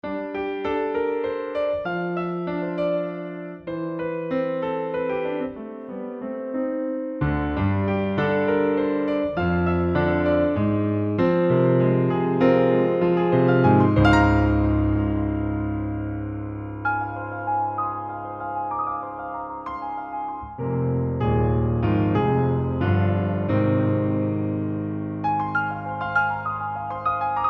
Waltz

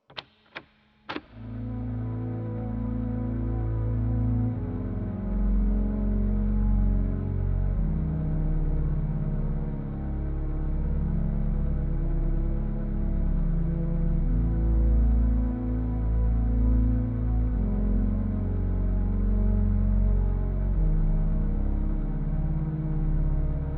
Slowed + Reverb

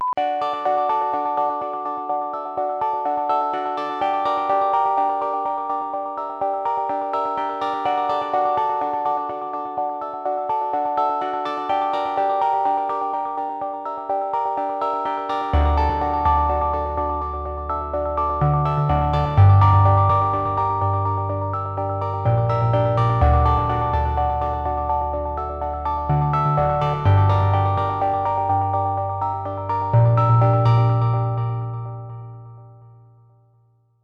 loop; HALLEY LABS R&D

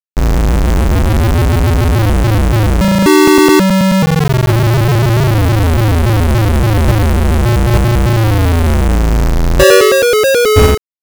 guitar

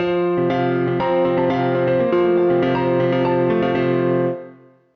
piano; Project Yume 2kki; Yume Nikki

